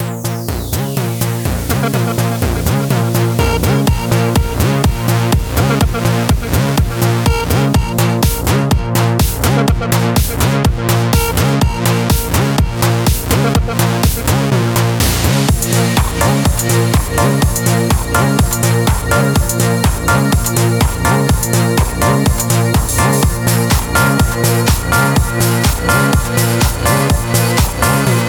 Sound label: Tech House, Club; RS Massive; Tech House 1